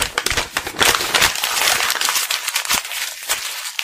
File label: paper crumpling